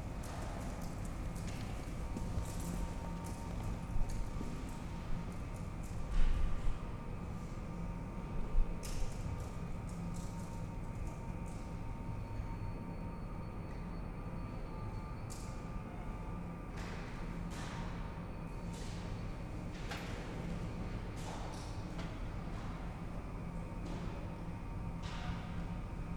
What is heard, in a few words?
Right Tone